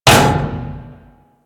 Ship Hit Effects

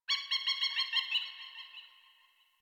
Birds Medium, Ambience, Plains, Landscape; One Offs; Warframe; Eidolon Plains

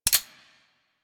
Sniper Rifle Mech; Female

